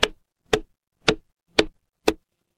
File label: instrument wood block